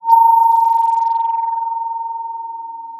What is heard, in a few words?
Sound of a phone ringing